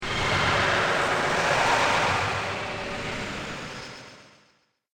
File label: Dark Wave Of Ambience; Scary Soundscape Sound Effects